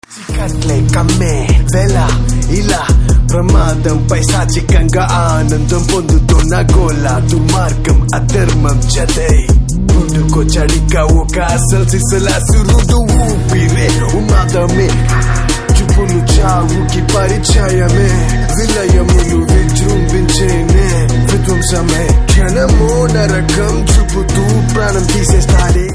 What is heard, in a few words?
Ringtone